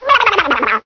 Voices zombaquarium die; Plants vs. Zombies

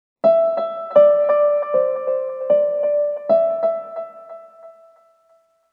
One shot Piano

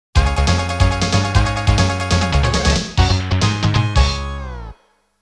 Guitar; dolucky's sandlot baseball; Guitar Rabbit

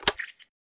switch off

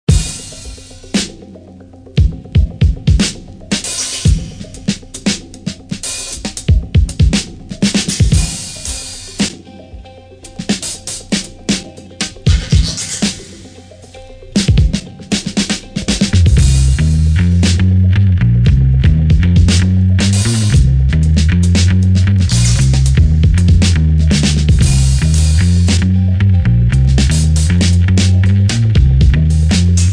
Tension Builder; bully scholarship edition

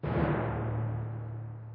Tymp

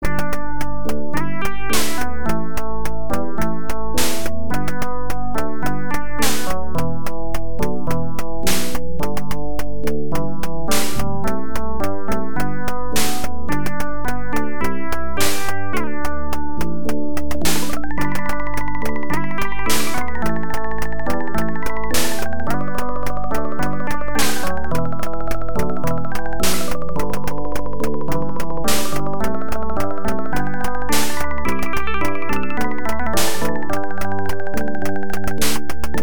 Round BGM; Shanghai 2; arcade

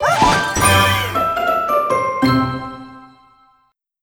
Crunchy Finish; Cookie Run Puzzle World

